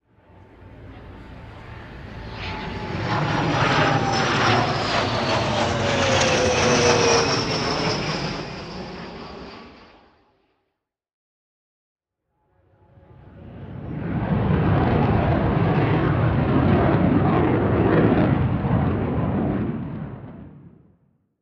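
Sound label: Airplane overhead far turn jet; Airplane Red Arrows overhead far turn jet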